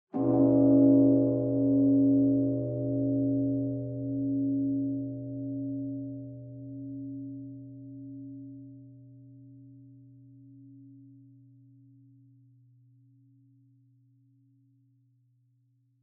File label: Bonsho Far Low